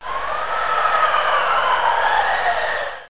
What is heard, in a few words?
wind